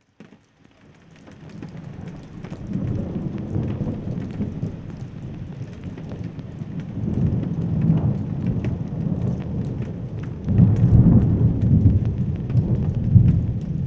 Thunder Ambiance; weather

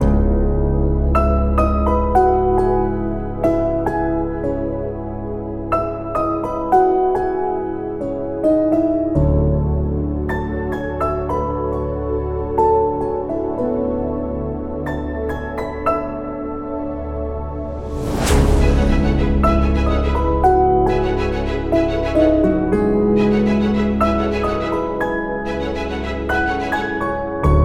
Big Game Menu

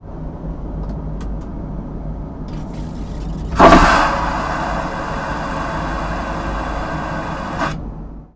Airbus A330 Toilet

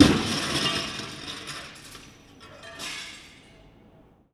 Smash

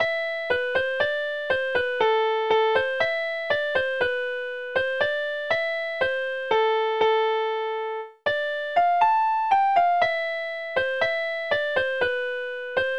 Tetris Black Midi; Samsung TV

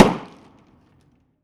Bag Pop

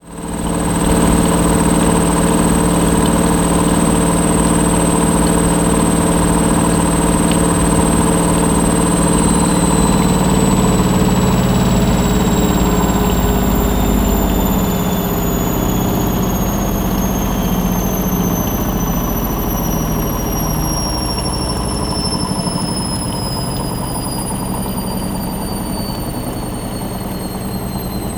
Spincycle

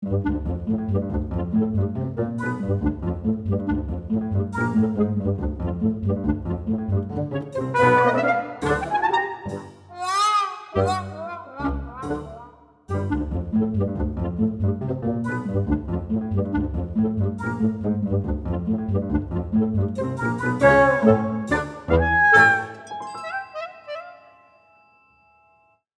Musical Cartoon

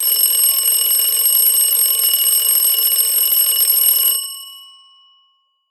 alarm; Alexa; Alarms

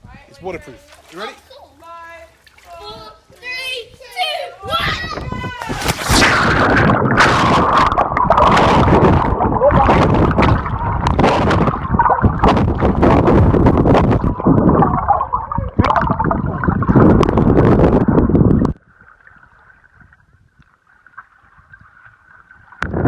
Water jump

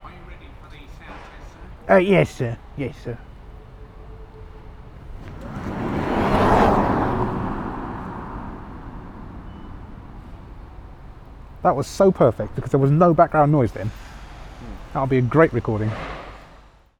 Tesla Model S at speed

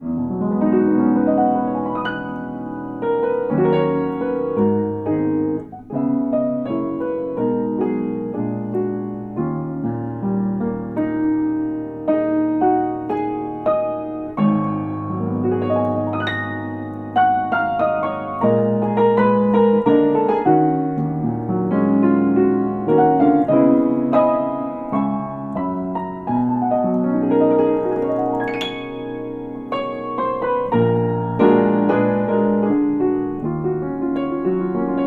Freshly tuned, open piano; Ambeos

